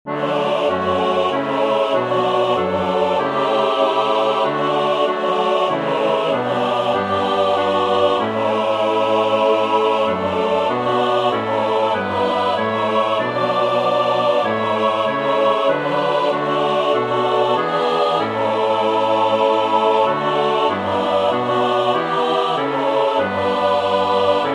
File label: SATB; Liedboek 2013